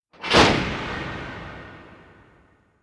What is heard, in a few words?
Sting Close the Lid; Brand X Music; Brand X Music Catalogue - Editor's Essentials